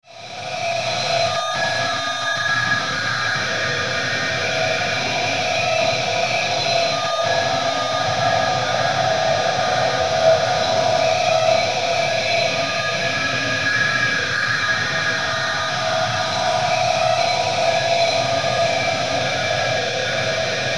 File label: DRONE FEEDBACK, Drone Feedback Eerie Reverb Swells; Blastwave